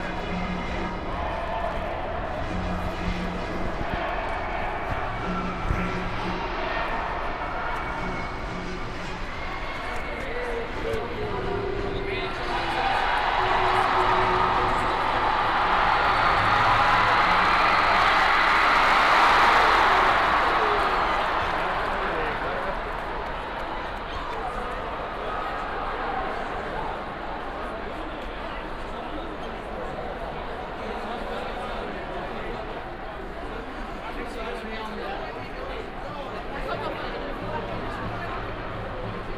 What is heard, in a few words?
Football Crowd S Korean Potsdamerplatz Berlin; Sound Scapes